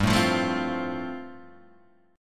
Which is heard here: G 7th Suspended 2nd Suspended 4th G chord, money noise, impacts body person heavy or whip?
G 7th Suspended 2nd Suspended 4th G chord